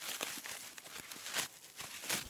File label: roping; minecraft; technic